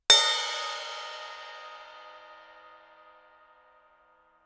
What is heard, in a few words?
crash stick bell ff stereo, Suspended Cymbals; Percussion